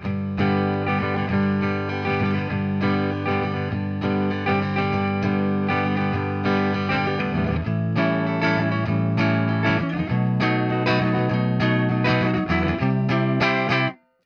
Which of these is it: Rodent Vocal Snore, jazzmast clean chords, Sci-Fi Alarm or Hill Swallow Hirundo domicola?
jazzmast clean chords